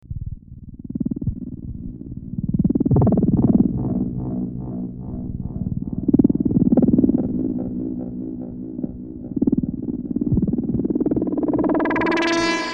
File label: noise